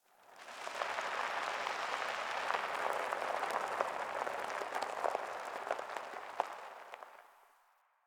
Tire On Cement One Off; Warframe; Ambience; Nineteen Ninety Nine

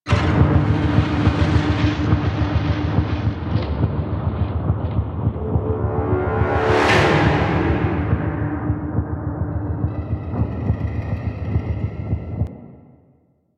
Forest Night Loop Music; Warframe; Sacrifice Quest

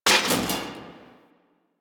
Missile Launcher Mech A; Weapons; Warframe; Railjack

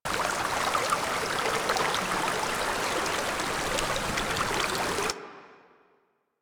Settlement Waterfall Small; Ambience; Warframe; Grineer Settlement

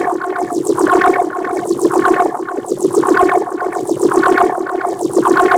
Grineer Ocean Defense Shield Loop; Ambience; Warframe

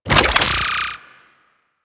Charge Gun Charged; Crp Charge Gun

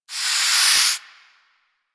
Ocean Steam Blast; Warframe; Grineer Ocean

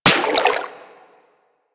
Water Sprayer Beam Impact Flesh; Warframe

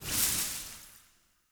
Blood Lust Leaf Burst; Powers; Fey; Champion

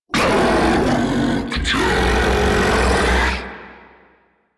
Octopede Idle; Warframe

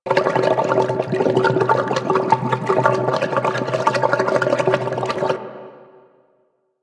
Ocean Cloning Gurgle Loop; Ambience; Props; Warframe; Grineer Ocean

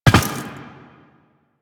Land Glass, Footsteps; Warframe; Tenno

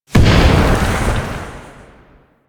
Sonic Dissipation Proj Explosion; Warframe; Focus; Vazarin

